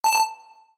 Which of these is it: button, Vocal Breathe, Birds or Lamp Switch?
button